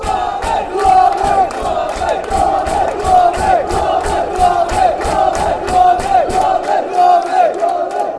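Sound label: blue army, football chants; hufc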